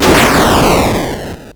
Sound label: Arcade Riffs